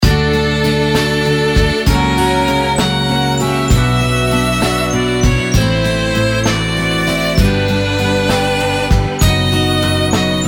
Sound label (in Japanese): アコースティック acoustic